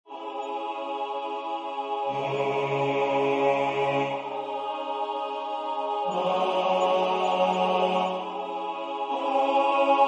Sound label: bas; Peace I Leave With You